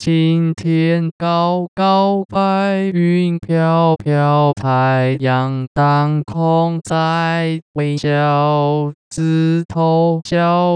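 Synthetic singing voice (male), Pitch coartcltn, Chinese; 青 天 高 高 [白雲] 飄 飄, [太陽] 當 空 [在微] 笑; 枝 頭 [小鳥] 吱 吱 在 叫, [魚兒] [水面任] [迢遙]; Mandarin Singing Voice; Pitch Coarticulation for Mandarin Singing Voice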